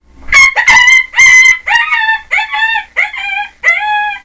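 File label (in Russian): стон раненого койота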